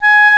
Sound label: WINDS & BRASS